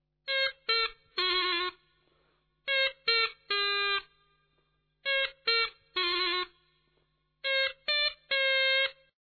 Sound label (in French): cromorne